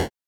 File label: chip wall